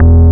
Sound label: BASS, Drum & Bass, 160 BPM; Ueberschall; Drum & Bass Flash Trackz